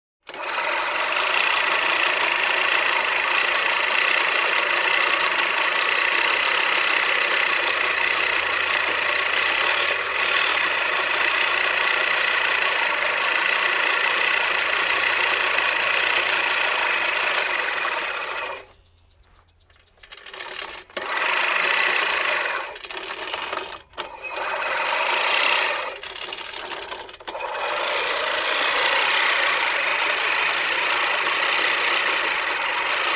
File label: Hedge cutting